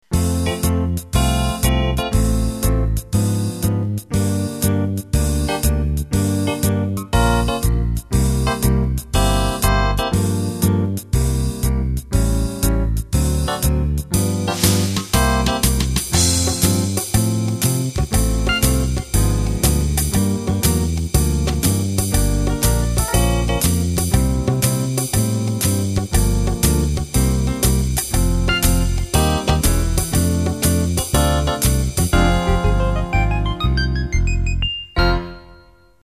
Ballroom Swing

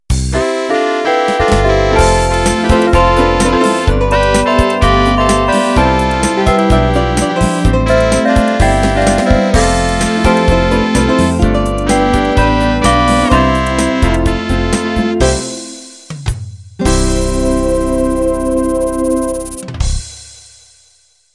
Beguine End

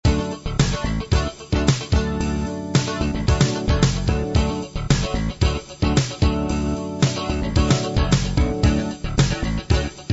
funk

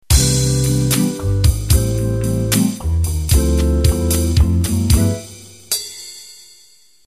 Jazz Vox Intro

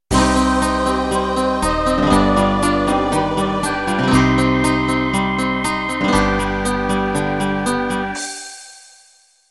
Schlager Intro

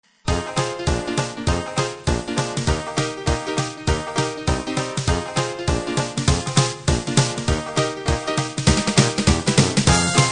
dixie; A "roots" Dixieland style with Tuba, Honky Tonk Piano, Banjo and Brass; Dixie 2

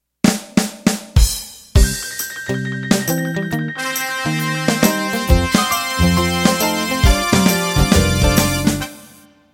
50s Rock Intro

